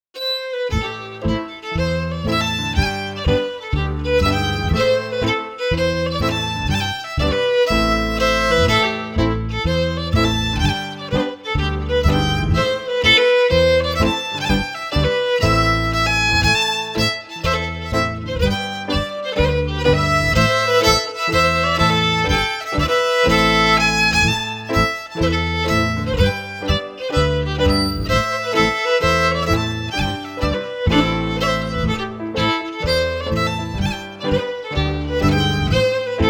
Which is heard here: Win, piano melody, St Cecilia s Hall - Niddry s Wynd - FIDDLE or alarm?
St Cecilia s Hall - Niddry s Wynd - FIDDLE